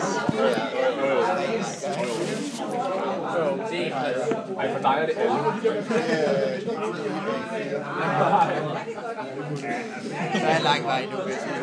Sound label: ambience from christmas bingo at twulefrokost in copenhagen